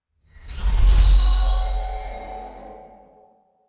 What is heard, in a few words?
resonant sci-fi portal opening swoosh